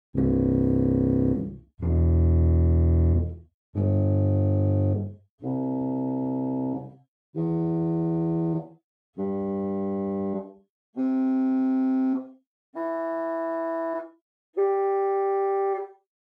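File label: Pedal Fagot 16 arp, organ; Buzard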